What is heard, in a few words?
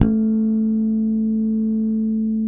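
Spector bass A2 harmonic; Northstar - New Gold VOL-1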